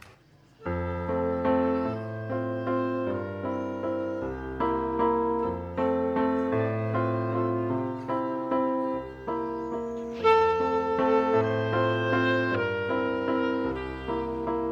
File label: instrumental